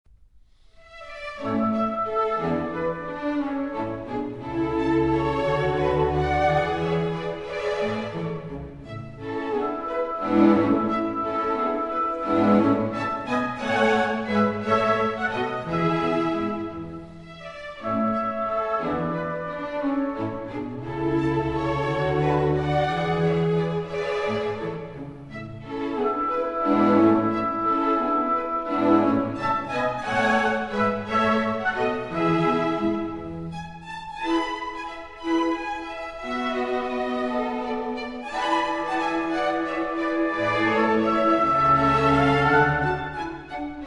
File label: Gavotte gracieuse, BALLET MUSIC; Mozart; Les petits riens; KV App.10; KV 299b